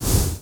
dash stop; player; Half Life Alyx

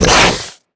grunt hard, headcrab; creatures; vox

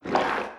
writhe, headcrab; creatures; Half Life Alyx; zoo

